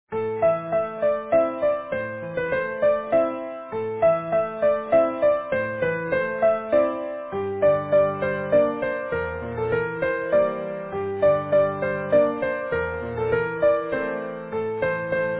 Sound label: Easy Piano